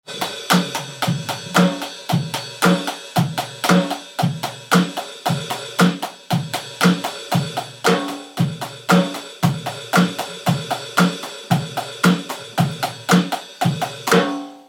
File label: live drum recording